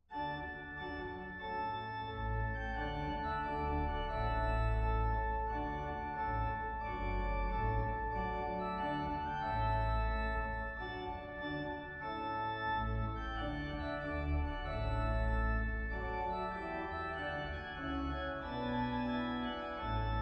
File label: trumpet tune, kirke; h.purcell; skaun kirke; brudemarsjer